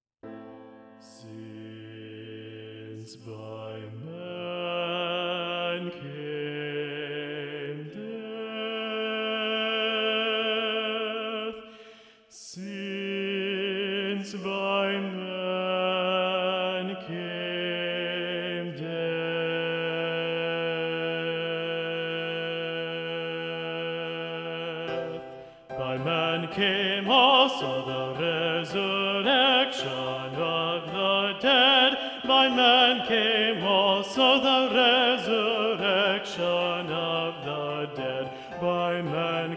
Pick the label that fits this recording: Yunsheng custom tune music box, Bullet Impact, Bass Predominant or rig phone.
Bass Predominant